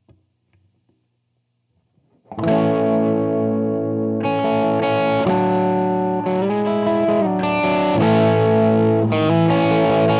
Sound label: DSL Stock Crunch, Marshall; Marshall DSL Transformers